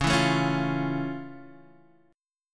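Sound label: F Augmented Major 7th D flat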